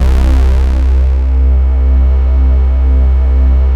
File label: JUNO 106, PADS; Masterbits; Vintage Voltage